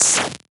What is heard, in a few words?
oh, Hats; drumkits; fl studio; goodtakimu drumkit